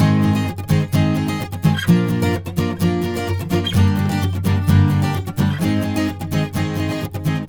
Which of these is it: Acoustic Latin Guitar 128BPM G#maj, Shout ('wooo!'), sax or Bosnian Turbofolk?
Acoustic Latin Guitar 128BPM G#maj